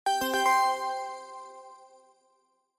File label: Chime Announce